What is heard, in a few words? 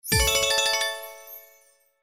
Symbol Win; slots; Majhong Royale